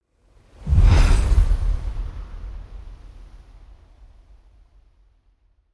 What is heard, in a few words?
magic spell wind shimmer; swooshes-swishes